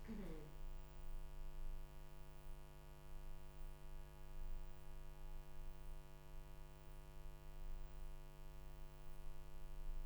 Room, Choir

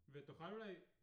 Piano A

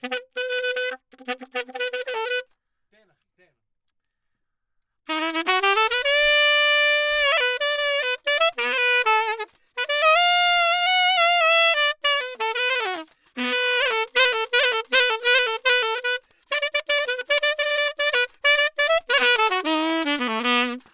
Sax; Gefell

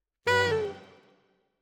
Alto, RNR for piano

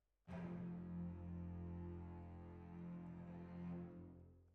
Oboe

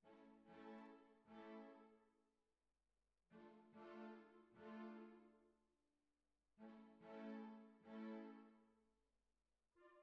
Cbasses

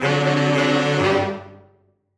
SAXES

